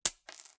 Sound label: coin; sling driver